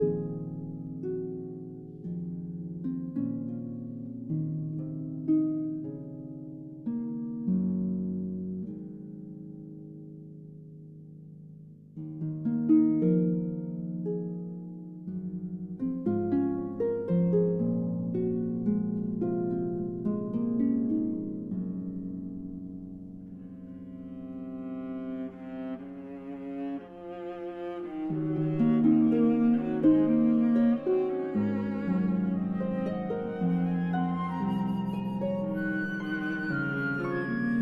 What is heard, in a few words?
Harp Concerto Lullaby